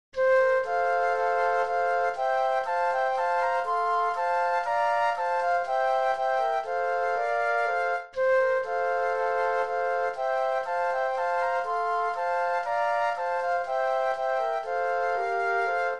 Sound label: O Little Town of Bethlehem U.K. Version Flute Trio; christmas